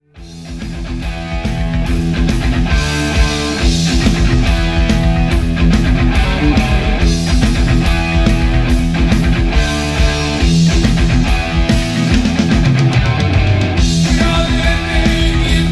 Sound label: Heavy Metal